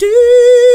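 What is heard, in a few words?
female vocals, Hip Hop; E-MU Formula 4000 Series Vol. 1; Hip Hop Nation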